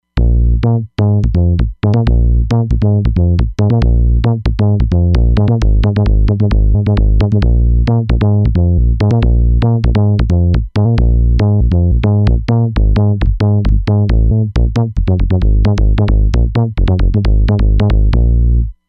Foundation, Roland Fantom XR

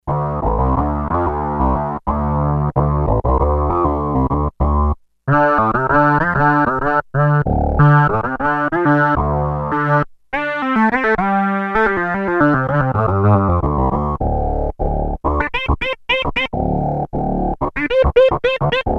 Son of Org - unison, Sequential Circuits Six-Trak; Factory Programs

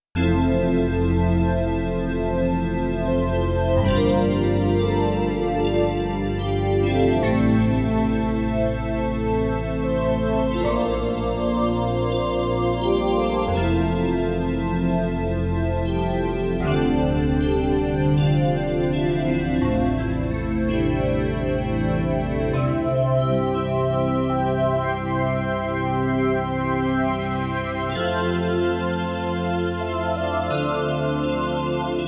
Operator Pad, Korg Radias-R

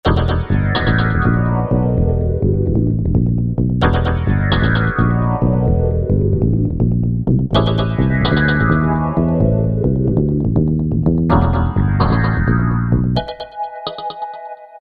mix, E-mu PK-6; Nock Back

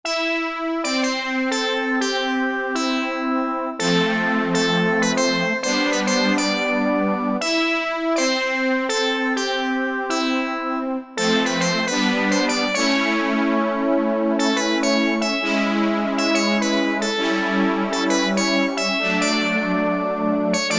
SYNTH SPLIT, Roland SC-880; Factory Patches

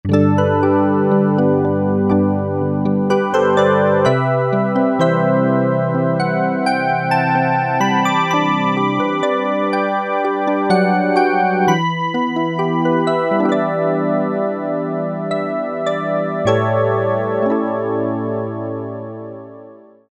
BL PNO, Ensoniq ESQ-1

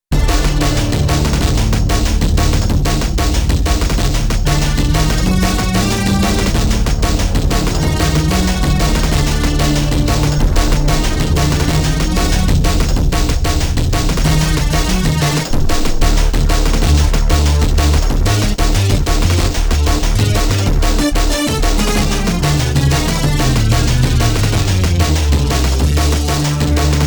Heli Base, Korg Radias-R

